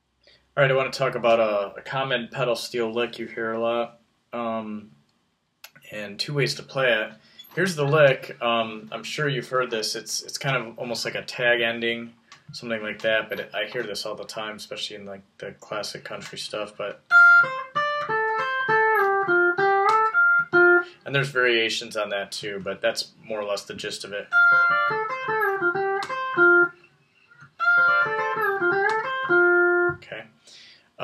common psg lick

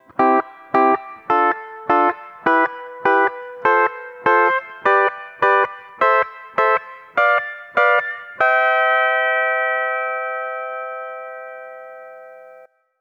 asc diat triads, steel guitar

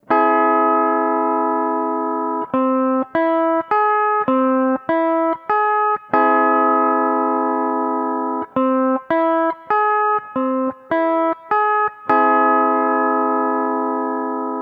c aug triad, steel guitar

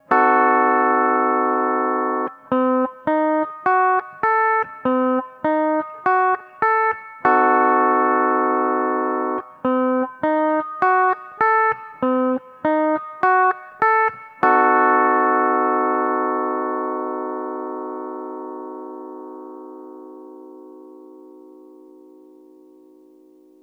c dim7, steel guitar

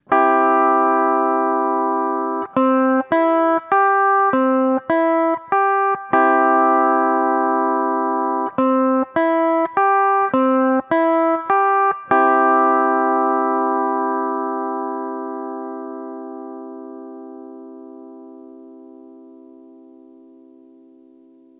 c major triad, steel guitar

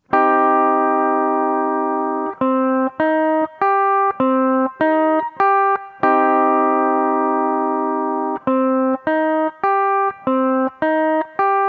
c minor triad, steel guitar

